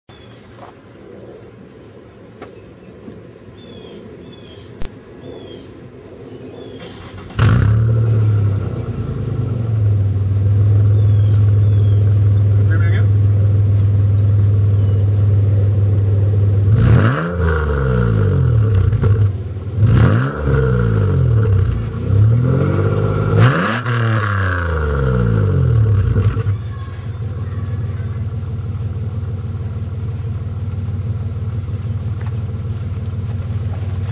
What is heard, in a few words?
rev, ypipe